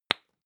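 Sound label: lipstick open hard, Bathroom; haus-garten; badezimmer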